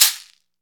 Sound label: CAXECERE, Latin Shakers